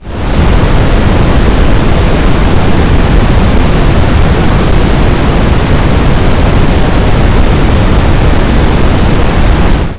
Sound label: noise; Yellow Noise Sample